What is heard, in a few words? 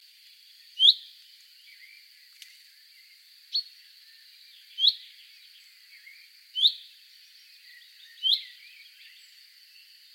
Common Chaffinch Fringilla coelebs